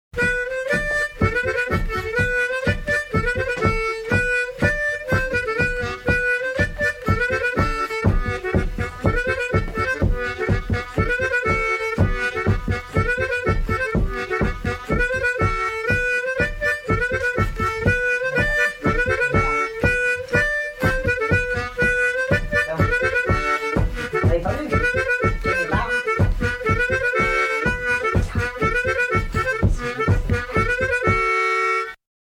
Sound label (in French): Polka Piquee